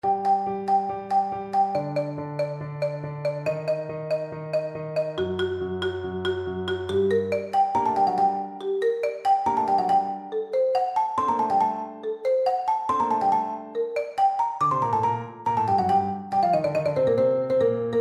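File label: mozart sym25, marimba; percussion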